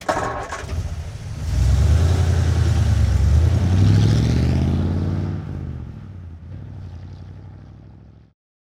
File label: 1947 Ford V8 Truck Ext Start Medium Away; Period Vehicles